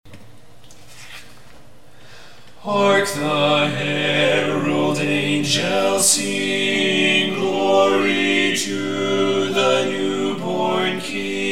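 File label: hark the herald angels sing baritone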